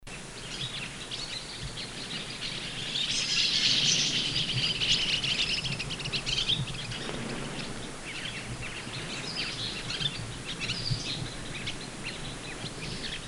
Black Chinned Yuhina Ssp unknown Group Call, Guangxi Da Yao Shan; yuhina a menton noir yuhina nigrimenta